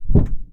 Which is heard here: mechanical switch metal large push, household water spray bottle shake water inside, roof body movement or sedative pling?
roof body movement